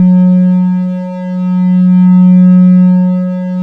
F#2 PRO5 LEAD, SQUARE LEAD; AKAI S-Series CD-ROM Sound Library VOL-7

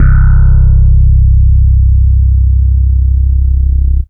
Single Electric Basses, Dance Floor Bass

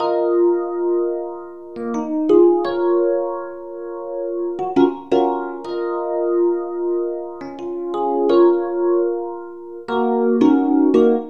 Drum & Bass

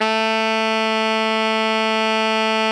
ALTO FF A 2, saxophones; Greytsounds; Philip Wolfe David Crozier's Saxophones

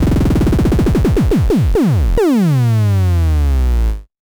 MS20 Tone Fall

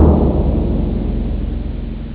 timpani; jazz jackrabbit 2; M8

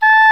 Oboe f; Roland L-CDX-03 Disk 1

